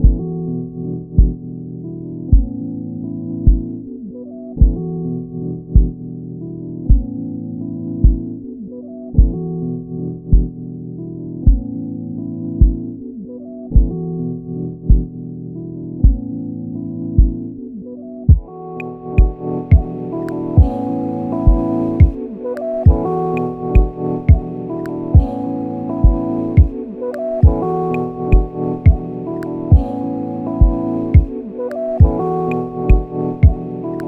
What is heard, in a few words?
Soul Instrumental